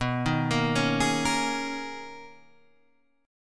B Major 13th B down, guitar; arpeggiated; JGuitar

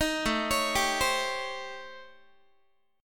A 7th Sharp 9th Flat 5th, Guitar; A7#9b5; arpeggiated; JGuitar

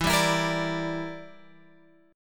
E Minor 7th Flat 5th E, Guitar Open G

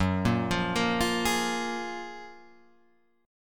F sharp Major 9th F sharp, Guitar Open G

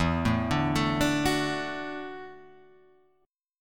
Gb Minor 7th Sharp 5th, Guitar

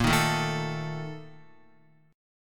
Bb Major 7th Suspended 4th, Guitar; strummed; JGuitar

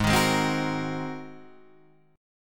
G sharp 9th, Guitar, Open D

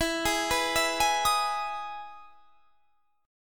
E Major 7th E down; EM7 arpeggiated

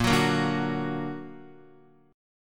Bb Augmented Bb, Guitar; strummed; JGuitar

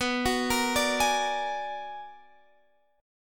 B-Minor Major 13th B down Guitar Half Step Down

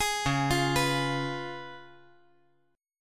C sharp 7th C sharp

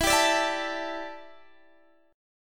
D Suspended 2nd Suspended 4th E

